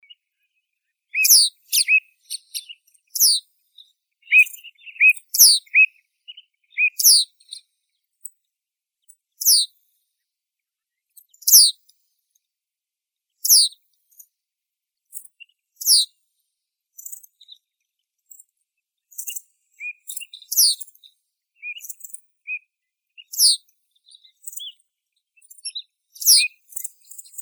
ovsyanka polyarnaya emberiza pallasi

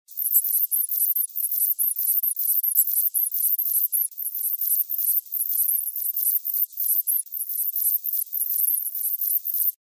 burozubka obiknovennaya sorex araneus

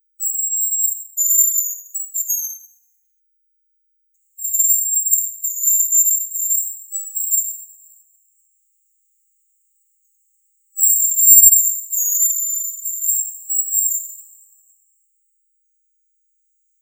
ryabchik bonasa bonasia, zvuki prirodi

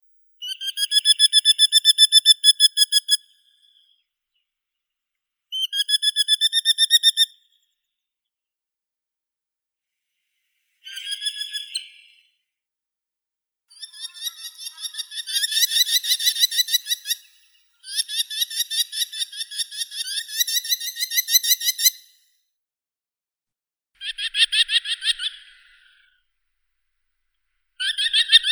derbnik falco columbarius

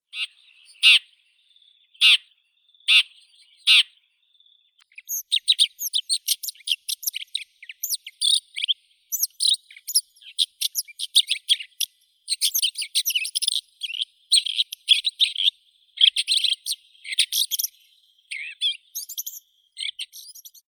zhulan obiknovennii lanius collurio, zvuki prirodi

